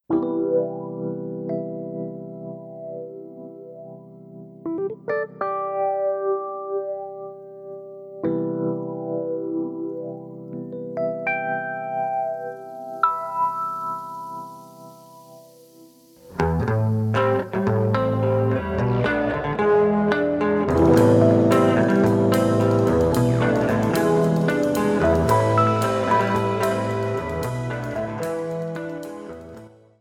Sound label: creep funk